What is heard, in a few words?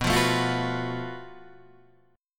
F sharp Minor Major 7th Double Flat 5th, guitar